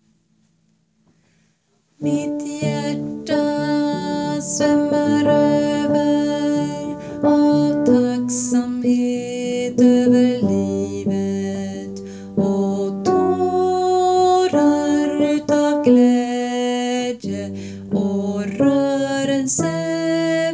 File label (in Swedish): bas; Tacksamhet; tacksagelse